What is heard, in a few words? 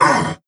pain; doomguy